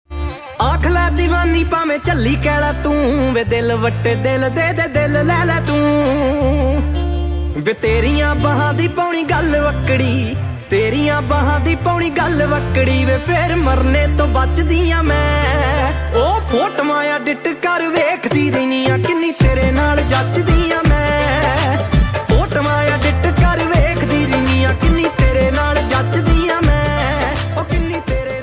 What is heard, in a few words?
DJ Punjabi Ringtone